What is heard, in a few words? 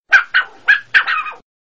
Dog Barking